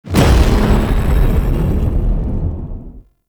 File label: Resurrection Impact Hit; Trinity Prime Boss; Warframes